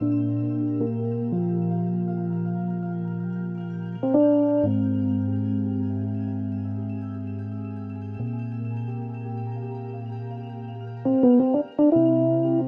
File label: theme siblings loop 120bpm